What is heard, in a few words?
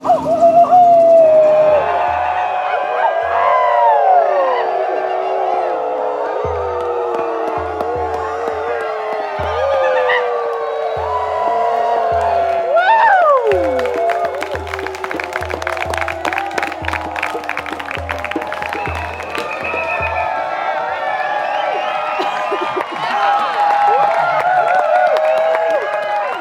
human crowd rainbow gathering people cheer clap howl happy; felix blume